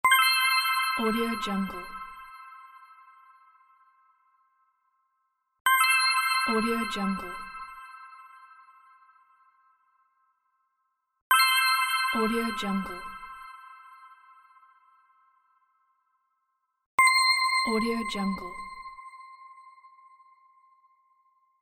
fairy message

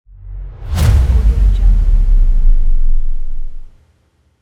speed whoosh

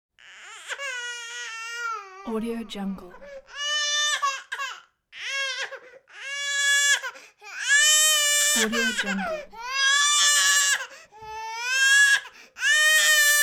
baby crying

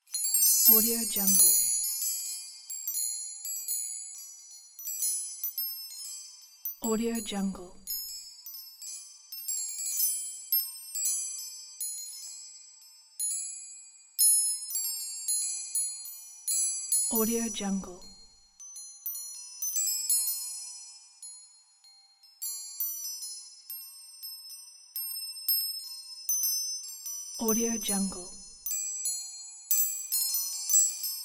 magic bells

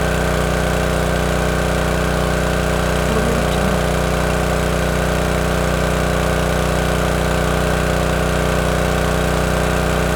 water pump run; prosoundfx

